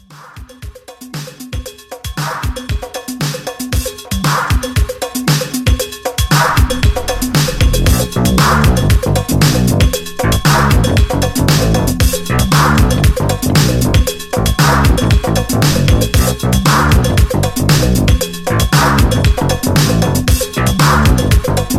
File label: 80s Conga Re Drum, 116